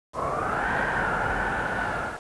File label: snow